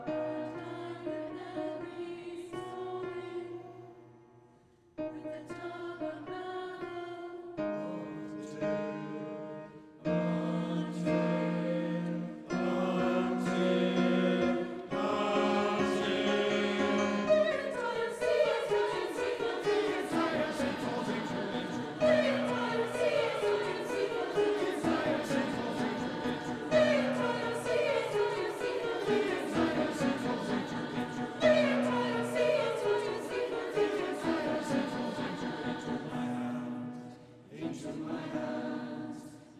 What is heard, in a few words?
Choir; Guinness Choir; The Weaver Ring